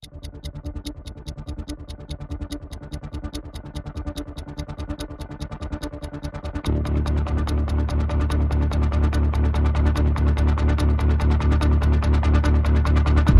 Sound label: Perc and Pulses Only